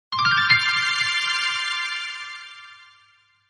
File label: nice sms tone